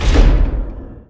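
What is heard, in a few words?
DOOR CLOSE; Shadow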